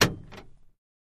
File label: Camaro Power Lock, chevy camaro